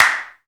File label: CLAP ALONE, HAND CLAP; AKAI S6000 CD-ROM - Volume 5